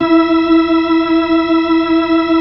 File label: B-3, Keyboards